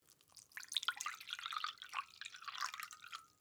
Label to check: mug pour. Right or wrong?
Right